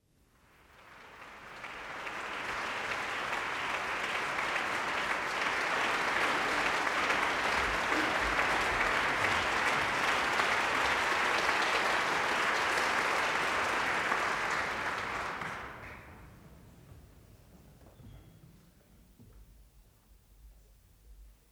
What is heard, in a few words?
Applause in